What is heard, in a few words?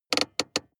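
sports car interior handbrake pull medium long; verkehr-transport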